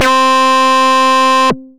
victory screech, synth lead; Bass; Ableton; Creative Extensions

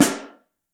Mono, Snare; Ableton Foundation FreePacks; Snare Designer

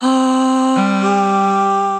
Chromatic Voices, Basic Voices; Voice Box

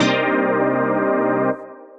Glassy Chord, Synth Lead; Ableton; Skitter and Step

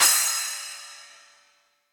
Splash 8, Cymbal; Direct Natural; Drum Hits; Standard Hits; Ableton; Factory Packs; Session Drums Studio

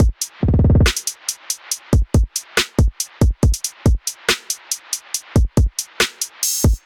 Horizon Kit 70 bpm, Trap Drums; Trap Drums by Sound Oracle; Ableton; Factory Packs

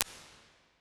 perc plate, made for drums; Convolution Reverb; IRs; Ableton

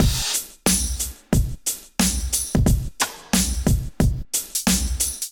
Kit groove 90 bpm, Drums; MIDI Clips; Dobbs; Ableton; Factory Packs; Chop and Swing

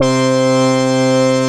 Synth Lead; Bass

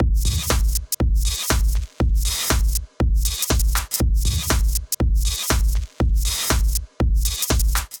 Kit, Drums; Factwerk; Ableton; Factory Packs; Punch and Tilt

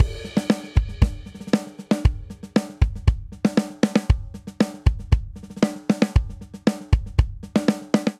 Straight Rock Breakdown 117 bpm, Drum; 4 4; MIDI Clips; Ableton; Factory Packs; Drum Booth

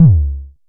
analog pitched, Kickbank; Ableton Foundation FreePacks

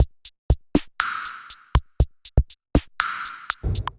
Kit, drum machines; D55 Essence Kit; Ableton; Factory Packs; Drum Essentials

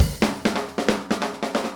137 Zydeco-Swamp Drums; Pop Rock

